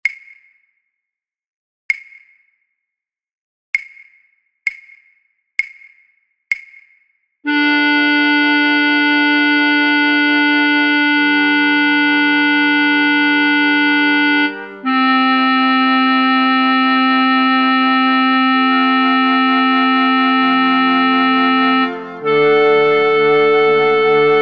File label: flute choir fluit; main-theme